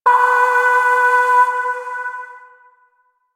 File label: Void Shawzin Oct Two B; Warframe; Zariman